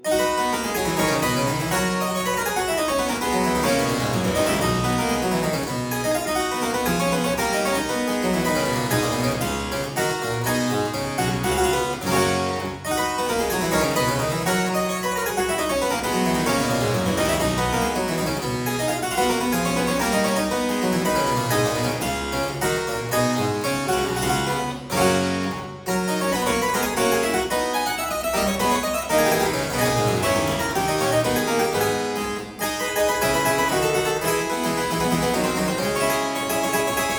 Aria in C major Variatio 4, Harpsichord

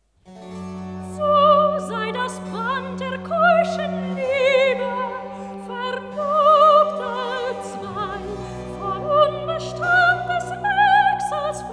Wedding Cantata - Recitativo; So sei das Band der keuschen Liebe; Baroque; J.S. Bach; Secular Cantatas; BWV 202

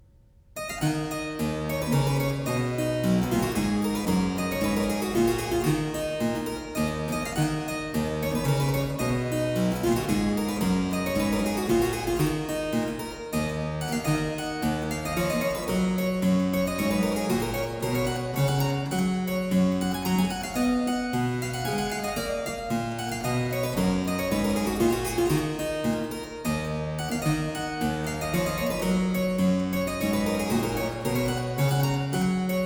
Hornpipe, harpsichord; Complete Chamber Music; Brilliant Classics; Miscellaneous pieces for the harpsichord

